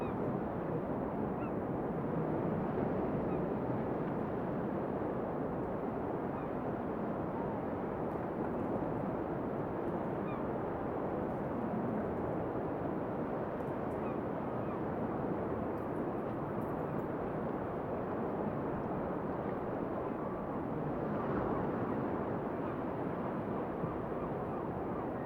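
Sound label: hotel interior morning; the 39 steps; KENT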